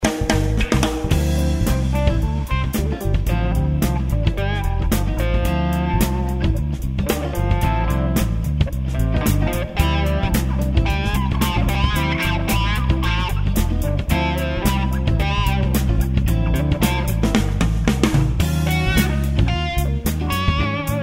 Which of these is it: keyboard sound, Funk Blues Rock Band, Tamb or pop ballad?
Funk Blues Rock Band